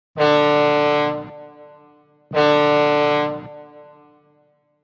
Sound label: A ship's fog horn blasting off.; Boats; Vehicles